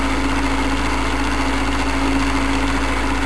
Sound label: Slow fly; FMS